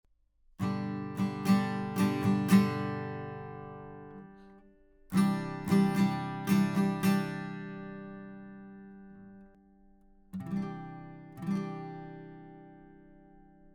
Rode Guitar

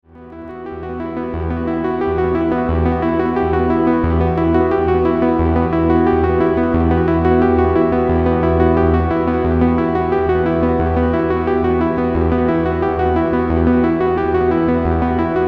Juno 60 Arp DELAY; LXP-1

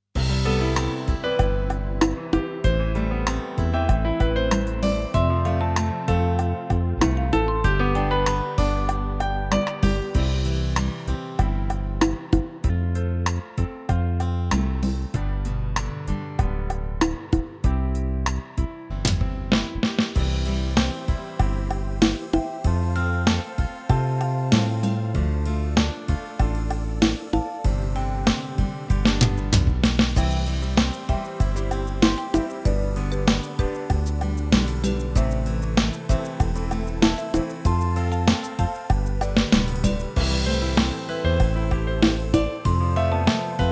DP95 Style

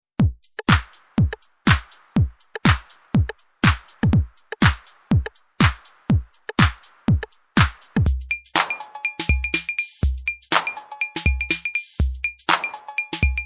TR8 Pattern + Kits

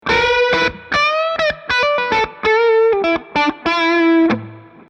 Solo Guitar Amp Beyer201 Neve1073 HiZ

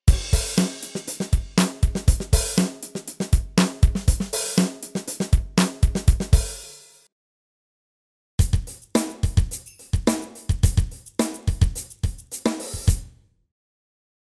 Drums

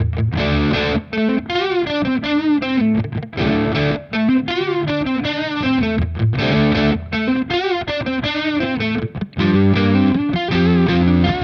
Harley Benton both crunch

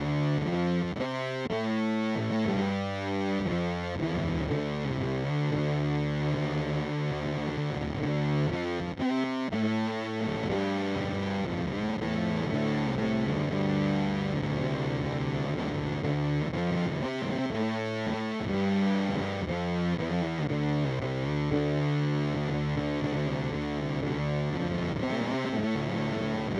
Pigtronix Space Rip

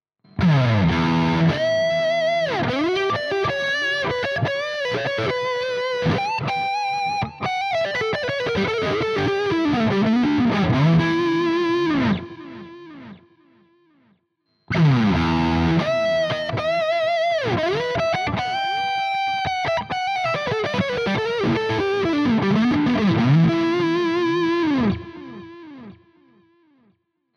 Lead Heavy Riff

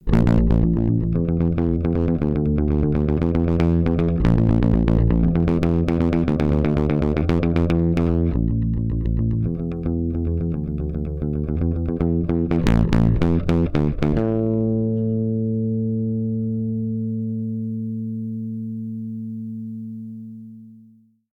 WA-2A P Bass Limit Overdrive; OG100

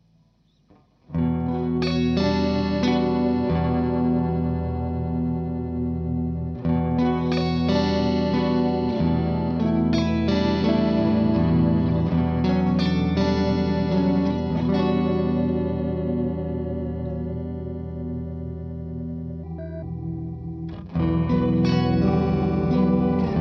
Pitch shift

